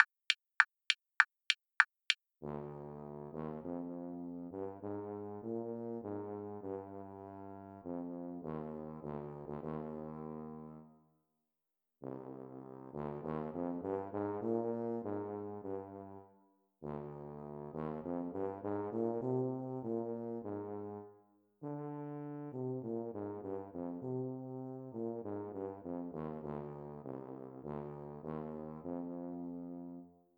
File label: tuba fast